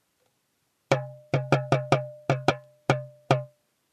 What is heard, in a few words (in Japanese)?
tutu drum